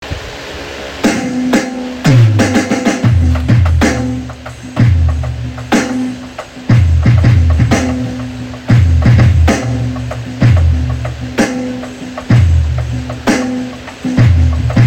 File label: drums